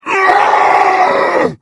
moarg hurt

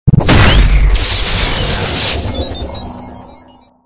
ar2 hit

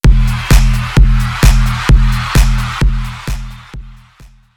boombox short; pets

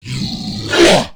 attack; tera creatures; abomination guard

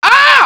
aah

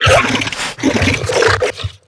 minion run chatter; tf; slender; attack of the radioactive thing

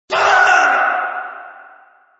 scream

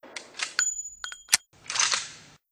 reload, mosin; hl2dm

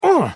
pain

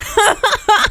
scout laugh happy, fem scout

